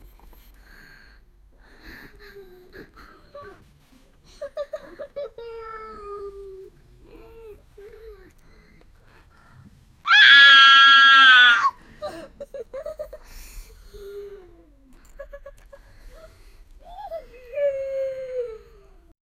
quiet sobbing and crying, child

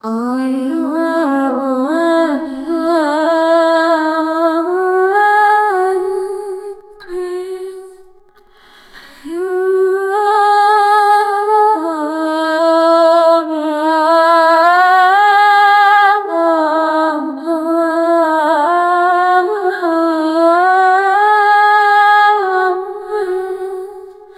women sing, singing

